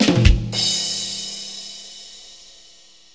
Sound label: Rimshot